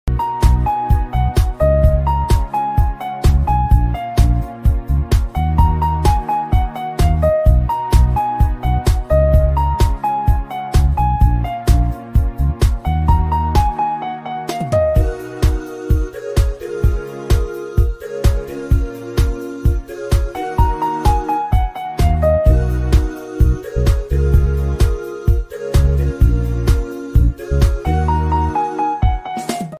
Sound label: Piano